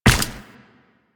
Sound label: Run Ice, Footsteps; Warframe; Tenno